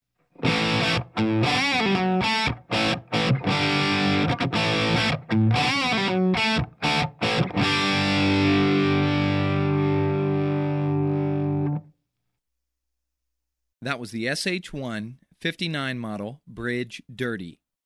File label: dirty